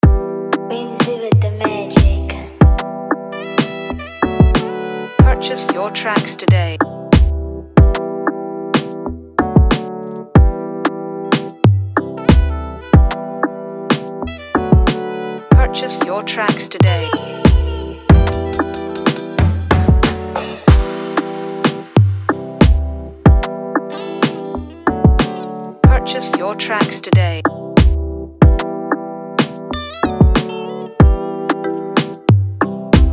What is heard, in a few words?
Afrobeat Instrumental